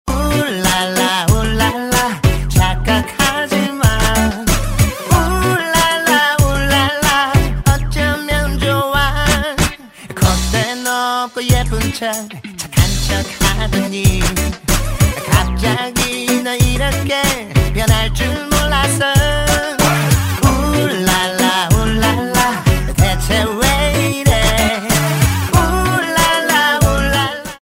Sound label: Ringtone